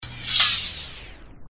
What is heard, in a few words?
slider sound